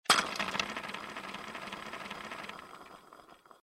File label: wheel roll